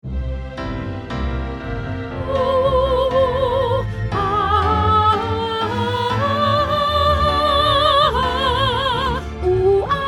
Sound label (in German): Sei hier Gast Sopran